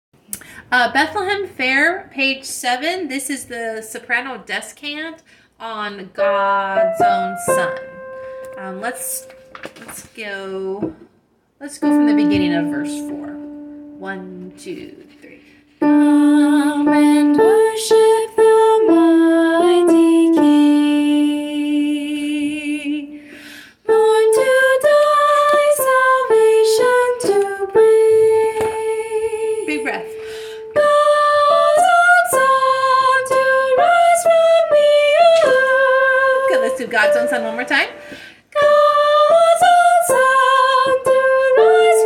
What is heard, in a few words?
Soprano 1 descant Bethlehem Fair; CHAMBER Choir